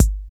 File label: bright kick drum single shot g key, Royality free bass drum tuned to the G note.